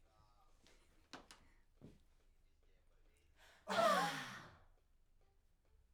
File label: Backs; SCHOEPS